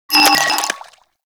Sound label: Scaldra AK Post Shot